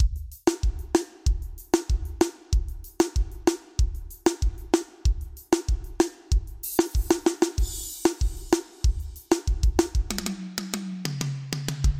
Vanerão

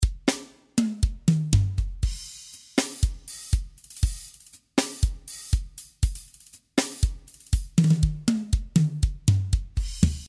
BATERIA ARROCHA

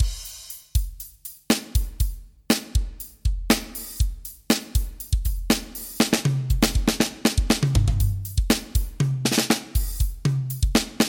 Sertanejo

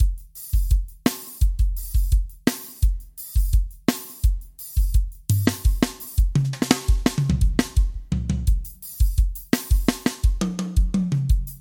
Forró Romântico

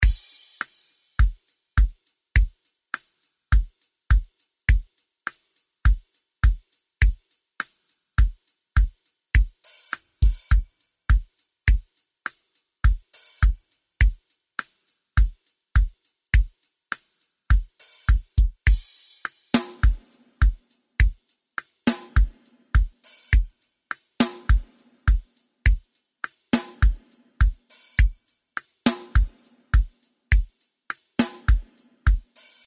ARROCHA SERTANEJO BPM103

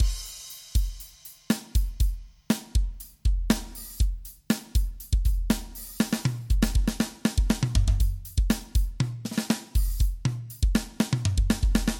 Sertanejo

